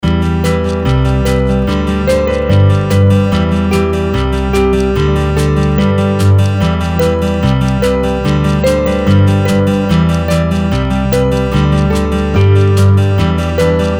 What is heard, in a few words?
uplifting acoustic full, Happy Upbeat